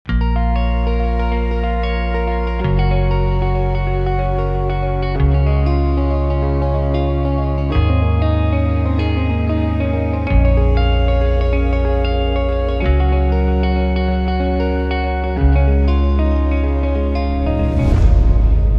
Travel Logos Journeying West Melodic Guitar Upbeat Positive Deep Hit Ending; Unrealsfx